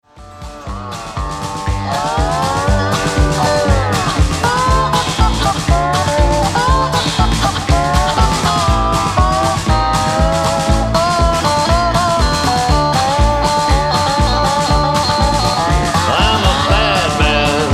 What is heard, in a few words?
Bad Man Blues